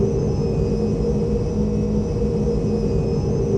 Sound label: Y-wing interieur; vaisseaux